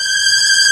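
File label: MINOR TRILL G1, Strings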